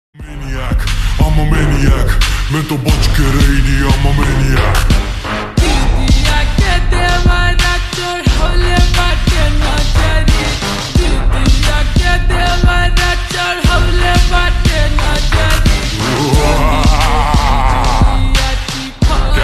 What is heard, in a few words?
Slowed Reverb Ringtone